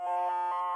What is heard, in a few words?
non critical message; allegiance